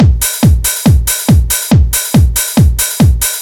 Drums; Techno Music Maker

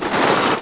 swoosh

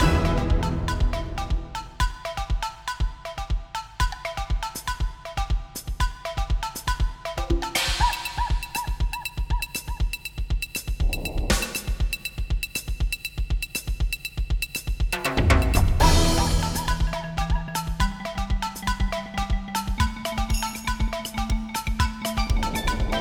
drums; GMPlay

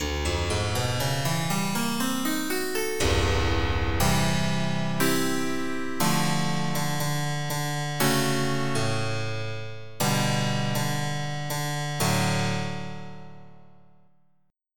Harpsichord; FF7

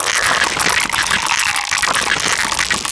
morph into scarabs; DAoC

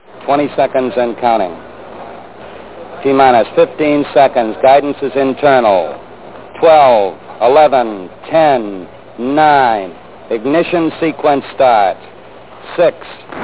LIFTOFF

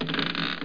chest

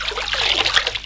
SWIM; BERMUDA

